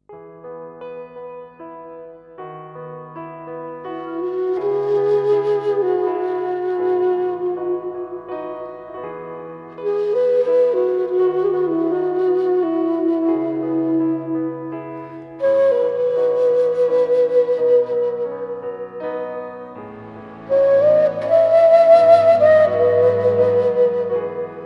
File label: Talking Anasazi Flute - endblown in E